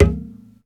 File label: MARIMBURA; drum